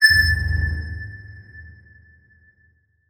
Title Transition Bell; Production Element Title Transition

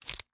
Weapon Knife Combat Knife Sliding Out Of Holder; AdobeCC

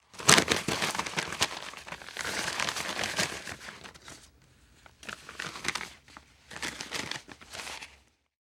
Foley Paper Fold And Put Away

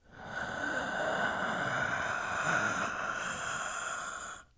Horror Scary Human Male Deep Inhale Slow Ghostly

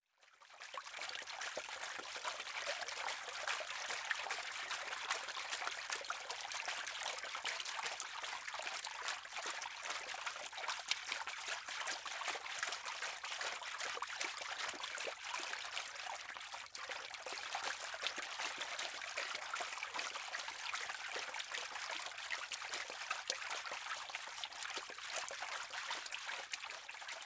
Liquid Water Swirling

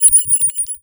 Beep Multiple; Multimedia Internet CD-Rom Flash

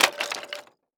Crash Concrete Dropping On Wood Debris Short; crashes; AdobeCC

